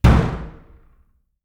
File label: Bang Door Knock